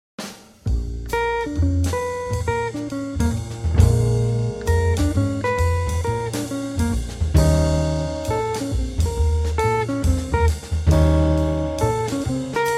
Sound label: Half Whole Blues